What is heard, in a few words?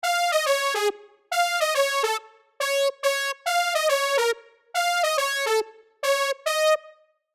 Db 120, Jazz MIDI Melody; Patience